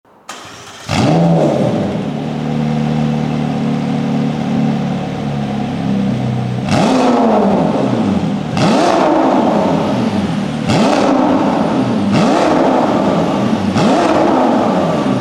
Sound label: 750S XPIPE; performance